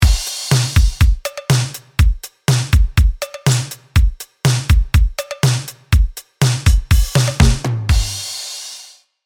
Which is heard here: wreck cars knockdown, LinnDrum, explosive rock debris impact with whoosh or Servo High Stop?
LinnDrum